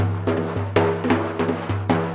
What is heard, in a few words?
arabic perc, Arabic Percussion Kit